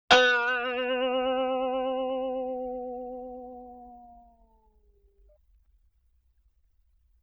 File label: Boing Box High; Vintage Cartoon; Hits & Tones